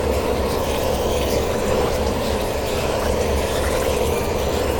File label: mysticism travel lp